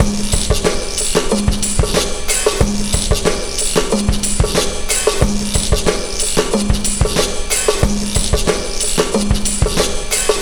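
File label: drum 092, Breakbeat, 092bpm; Best Service ProSamples vol.40; Memphis Belle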